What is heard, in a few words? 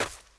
step dirt; incredi pack horror